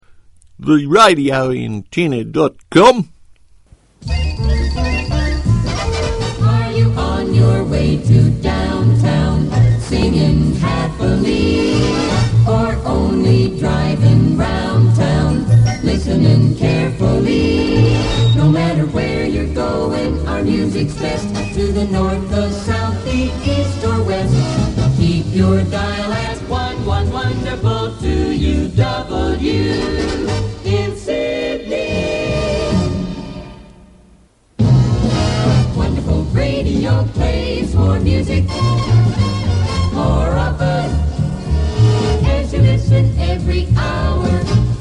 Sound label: Jingles; Aircheck; 2UW; circa 60s